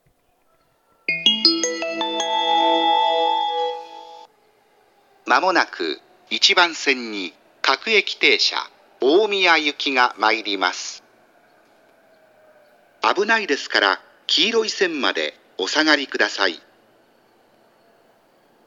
ueno local omiya, station; jr-east

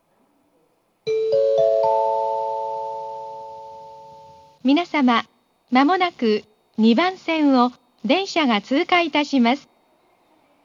pass, station; rinku-town; nankai-electric-railway

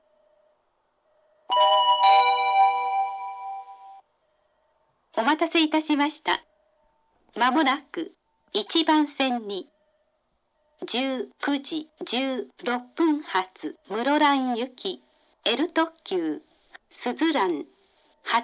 limited express; minami-chitose; suzuran8; muroran; jr-hokkaido

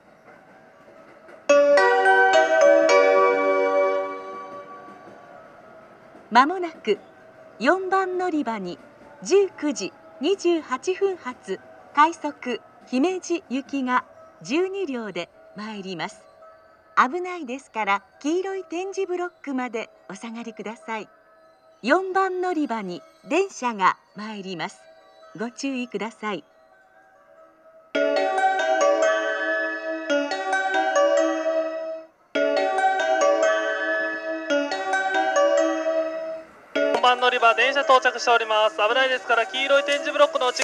amagasaki rapid, station; himeji; jr-west

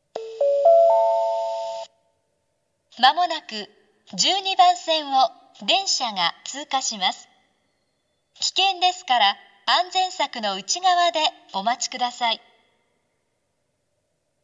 iiyama pass, station; jr-east

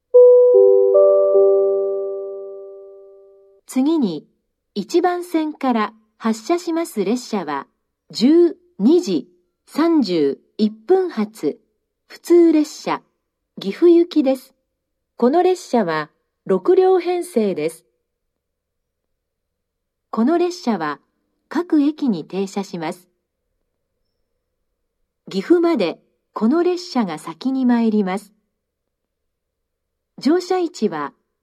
inazawa local, station; jr central; gifu

